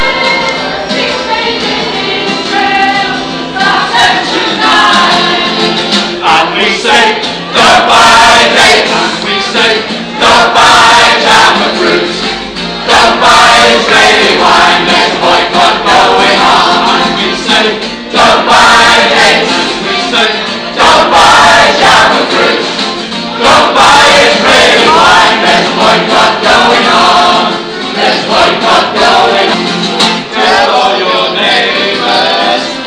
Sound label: socialist choir liverpool